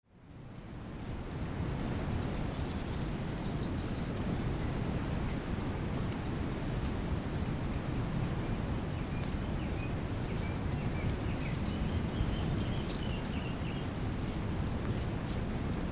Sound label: bird beach